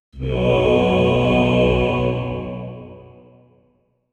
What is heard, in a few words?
deep gothic mens choir, hard hit